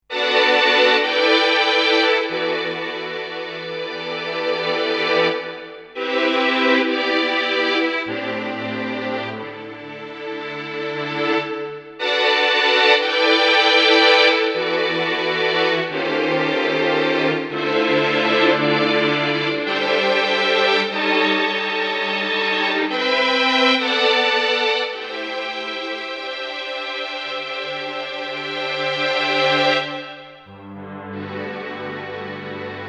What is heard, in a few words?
Violins Tape